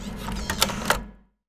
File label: DISK EJECT; OFFICE; AKAI S-Series CD-ROM Sound Library VOL-6